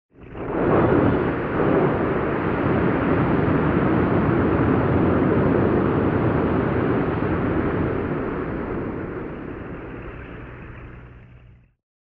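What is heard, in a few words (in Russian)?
сбрасывает балласт; Звуки подводной лодки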